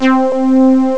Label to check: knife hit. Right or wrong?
Wrong